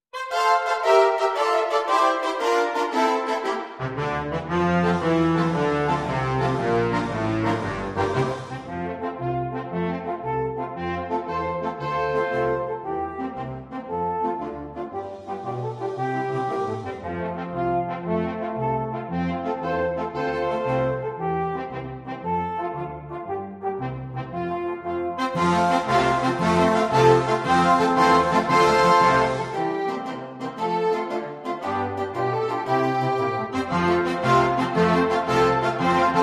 Alphorn Antics - Fanfare

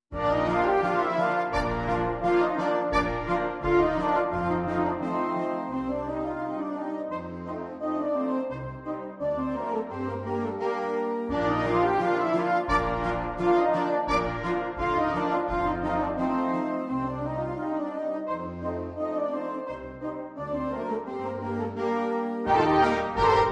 Polka for Prague Students - Fanfare

